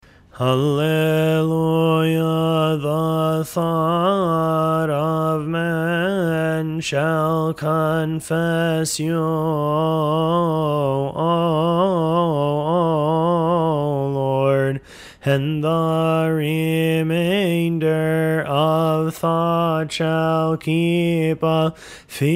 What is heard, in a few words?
alleluia, hymns